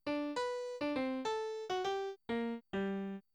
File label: Jingle Piano